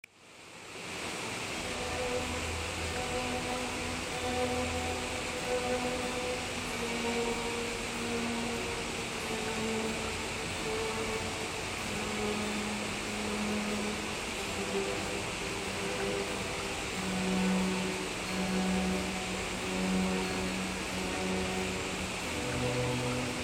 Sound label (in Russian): vodopad